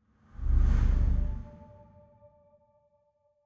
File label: Rock Rise Whoosh; Desert SFX